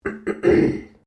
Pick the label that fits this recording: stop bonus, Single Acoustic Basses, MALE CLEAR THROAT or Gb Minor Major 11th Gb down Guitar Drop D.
MALE CLEAR THROAT